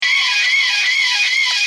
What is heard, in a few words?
the riff